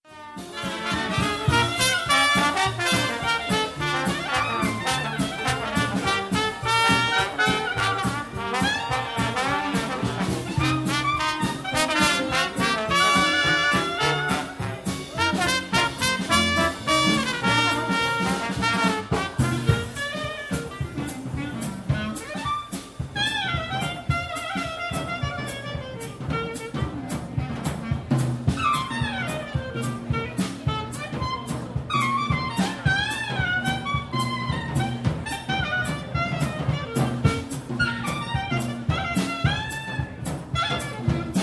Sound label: persian market